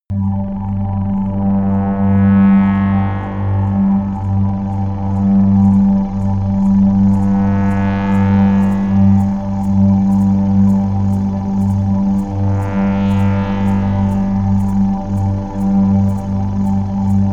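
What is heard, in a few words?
fluttering, pads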